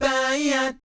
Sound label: BAYEEAT, Vocal Planet Jazz Fx; Spectrasonics